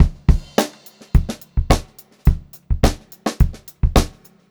106 GROOVY, Funk Groove Drumloops; USB Soundscan vol.38; AKAI; 90 s Sample CDs

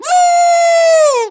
CHINA, Heart of Asia; Spectrasonics; CHINAFLT

